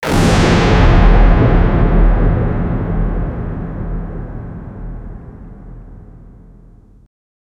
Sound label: epic advanced spiritual l, energy